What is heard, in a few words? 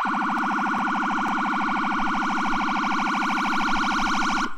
PIERCER and Howler